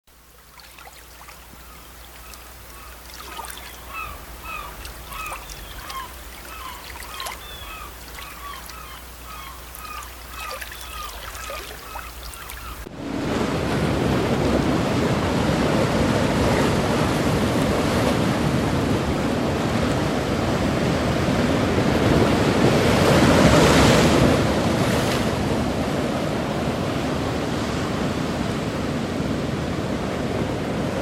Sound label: beach sounds